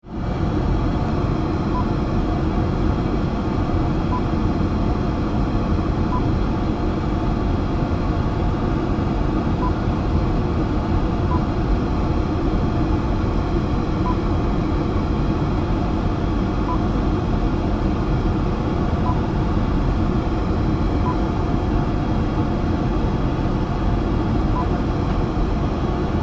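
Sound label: airplane toilet atmosphere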